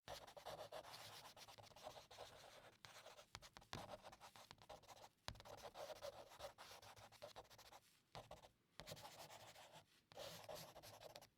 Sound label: drawing on paper with charcoal